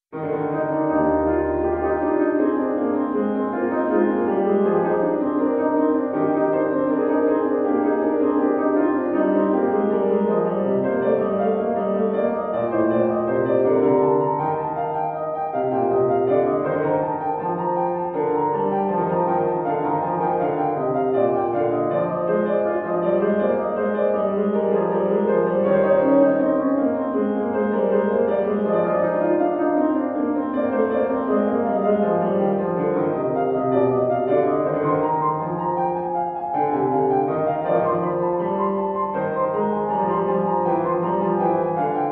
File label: inventions a 2 voix piano; bach johann sebastian; 15 inventions